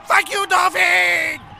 dolphon

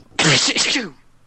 sneeze

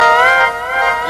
pewewew

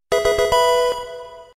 pokemon item found sound effect; pokemon red blue yellow

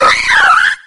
mankey

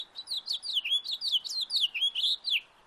house finch song bird singing

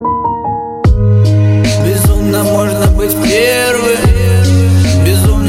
bassboosted

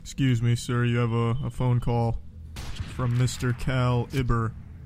hit

